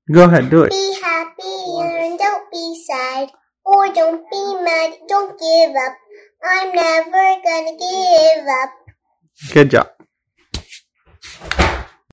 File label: music